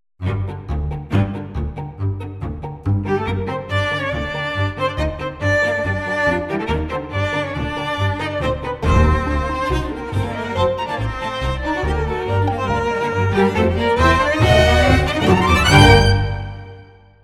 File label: solo strings gypsy